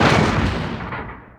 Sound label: avatar step; nod